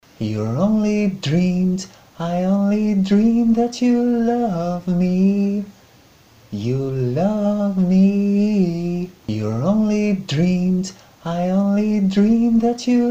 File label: Vocal